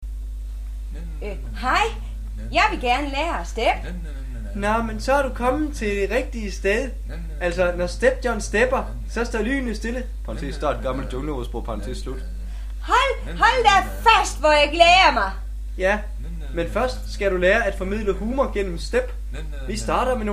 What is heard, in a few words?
step sketch